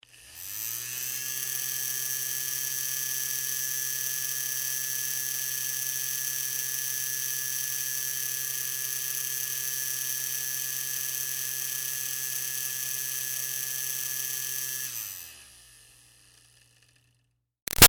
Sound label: Fan Small Handheld Med Far Run Long Steady; Electronics; Big Room Sound